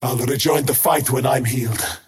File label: crown teleport pain; skywrath mage